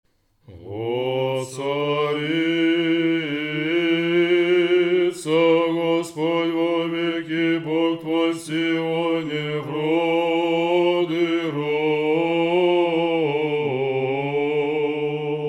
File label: prokimen tone 3